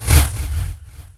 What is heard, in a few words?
attack post; weapons; dota; elder titan